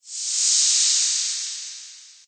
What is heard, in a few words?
whoosh long